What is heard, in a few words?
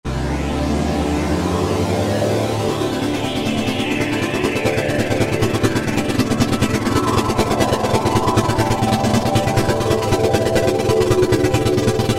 Cyborg Pad, Korg TR-Rack